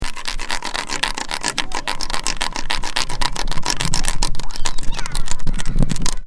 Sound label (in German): Ketten ratschen